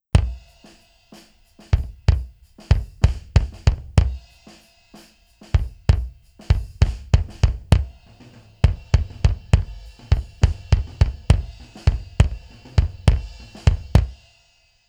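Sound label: kick drum, drums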